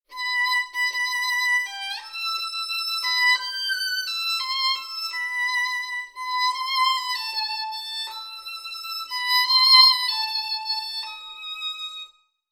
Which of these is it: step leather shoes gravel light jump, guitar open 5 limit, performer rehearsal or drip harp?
performer rehearsal